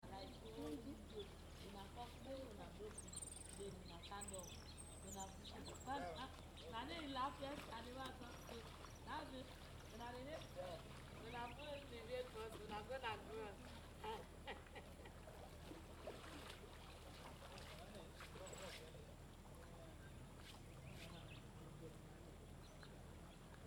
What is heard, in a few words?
river 3 birds